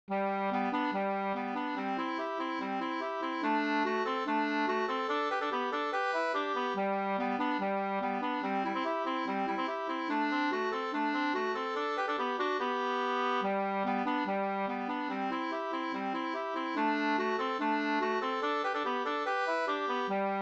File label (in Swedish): stjuls, Gdur